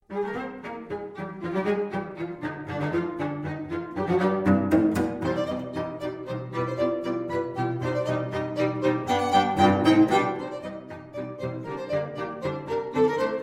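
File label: string trio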